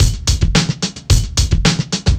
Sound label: Drum loops, Boombap